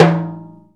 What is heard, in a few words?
CNTR STRK, Timbale; Timbale Battery